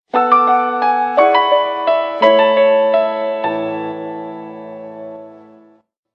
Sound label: nokia n gage, Nhạc Chuông